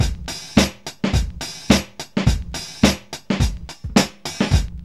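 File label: 106 bpm, Funky loops; Zero-G; Funky Elements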